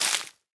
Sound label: Leaf litter break; Ściółka; Zniszczono blok